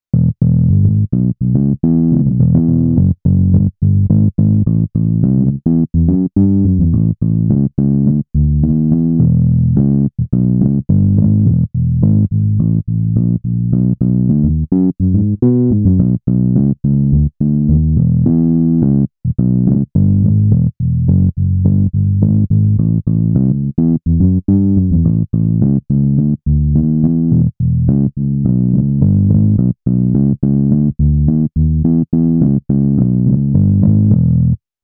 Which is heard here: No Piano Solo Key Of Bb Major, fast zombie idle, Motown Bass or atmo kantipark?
Motown Bass